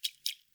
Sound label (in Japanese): しぶき 中, ぴゅっ 短め